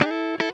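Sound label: Guitar Slide Riff; Digital Sound Factory Xtreme Lead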